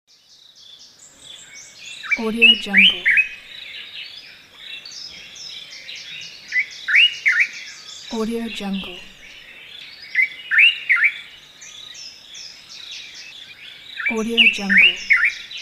darkcapped bulbul calling; ecosound

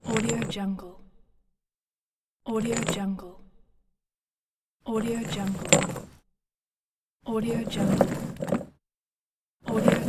large rusty chain

